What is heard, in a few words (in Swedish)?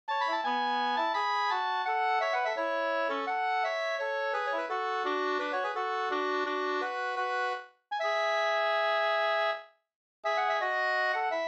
vals A D dur; En afton pa Oljaren